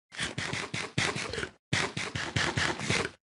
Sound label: eating sound effect; Minecraft